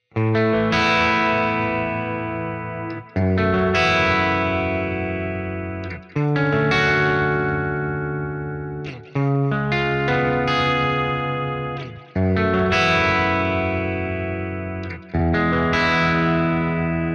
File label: guitar on